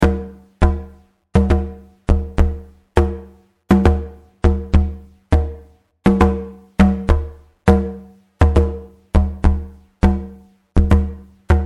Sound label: drumit hands